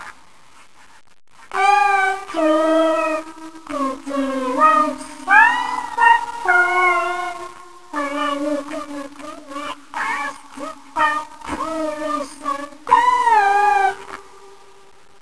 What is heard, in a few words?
AIR ESCAPING